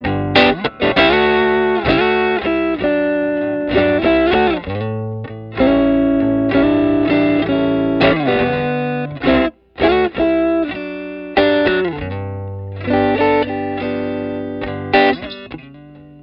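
STRAT NECK WARM DRIVE OVERDRIVE LICK, Neck pickup through a 1950 Fender Deluxe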